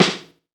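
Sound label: Snare verb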